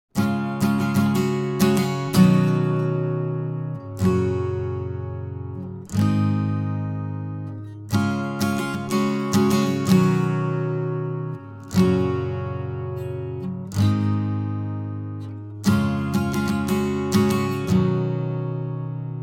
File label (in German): wumm wumm Gitarre, Akustik Gitarre; resonanzen